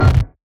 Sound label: burst; Watcher